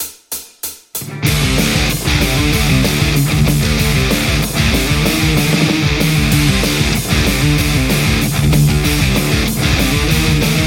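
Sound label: Telecaster JCM800; metal; mercuriall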